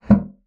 bassoon slap; Xsample VOL-6; C BASSOON

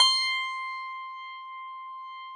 Harpsichord C4; Classical Prepared Pianos; Best Service ProSamples vol.53; Total Piano

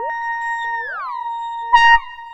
Beat Blip Loopman; Sonic Foundry (Sony Creative Software); Ma Ja Le Ethereal Textures

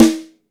YAM, YAMAHA SNARE

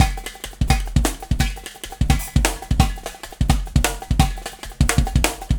Warp Drive Beat, Processed Drumkits

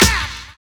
LIVE CLAP; Univers Sons

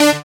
SYN DANCE; Sound & Vision - Gigapack II

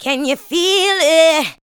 ADLIBS

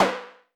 Tempest Analog Snare

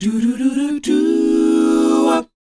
DOWOP F#, Vocal R&B DOO WOP; ILIO; Vocal Planet

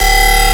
Additive Grinder Bass

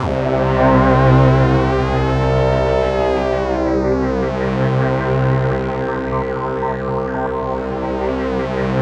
SYN K-2000, Roland

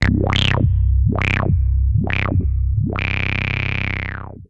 Riff, Synths BASS

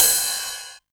909 Ride; Drums

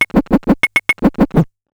Bent Talking Teacher, Circuit Bent

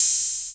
ELE OH, Drums Dance & Groove; USB Soundscan vol.11; AKAI; ELEC. KIT

